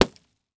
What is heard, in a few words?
Weather Snowball Hitting Car